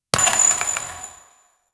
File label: in game legendary item